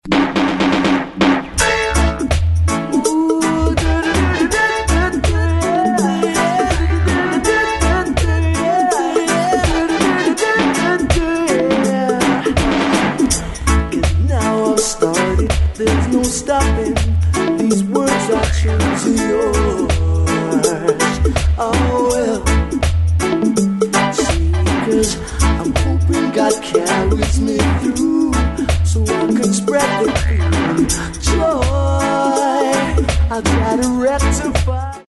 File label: SAX